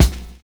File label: PICCOLO